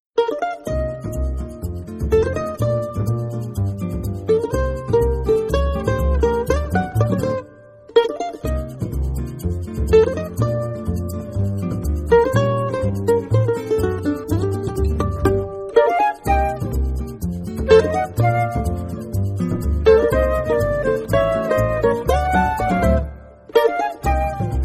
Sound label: groove